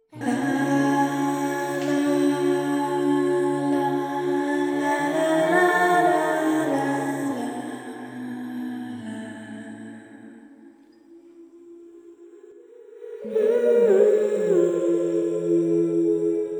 Music box singing female; Phasmophobia

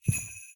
foot; Phasmophobia; Santa

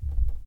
Underneath stairs footsteps; Phasmophobia

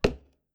wood; Phasmophobia

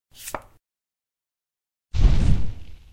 Tarot draw; Phasmophobia

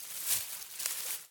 Bushes rustle; Phasmophobia

